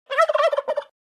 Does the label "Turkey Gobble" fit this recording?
Yes